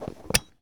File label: Plane P40 Seat Belt Buckle; Airplanes